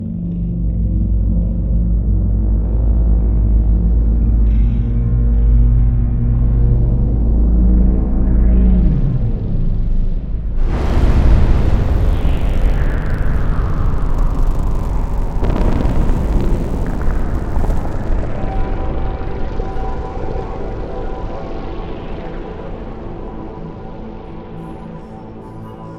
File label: intro title crawl, music; c17; hlvr